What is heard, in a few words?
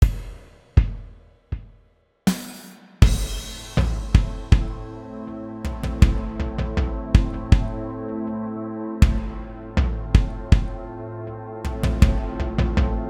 Ballad In A minor